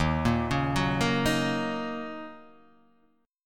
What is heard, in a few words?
D sharp Minor 7th